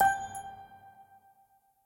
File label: wood chip minor fast